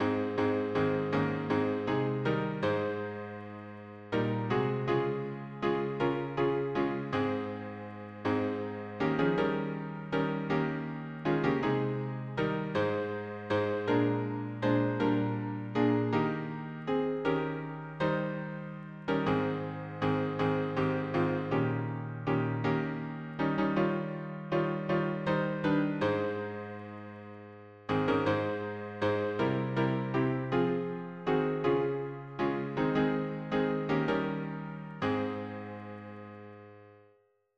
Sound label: harmony piano